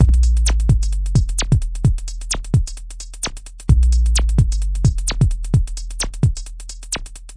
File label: analog, drum loops and breaks; 121 to 130 bpm; soundsonic nks analog berlin